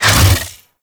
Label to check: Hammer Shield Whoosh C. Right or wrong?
Right